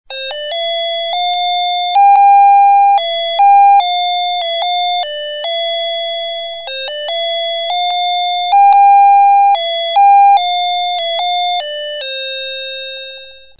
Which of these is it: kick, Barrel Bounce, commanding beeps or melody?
melody